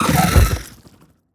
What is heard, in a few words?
biter death, creatures